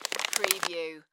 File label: Potato Chips Bag Move; sound-effects-bible